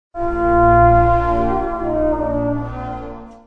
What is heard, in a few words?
trombone